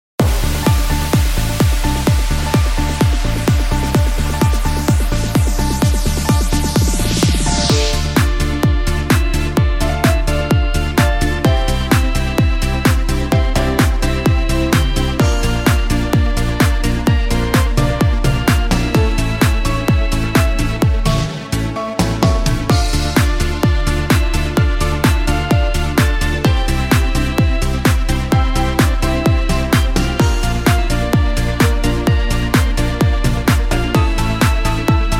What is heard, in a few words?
music